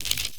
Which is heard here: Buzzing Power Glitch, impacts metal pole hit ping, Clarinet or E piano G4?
Buzzing Power Glitch